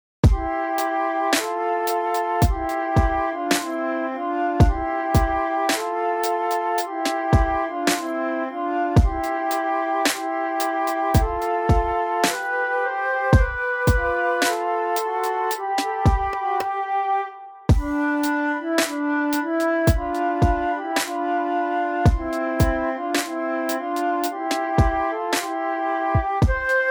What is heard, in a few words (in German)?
PLAYALONG mit beat 3 stimmig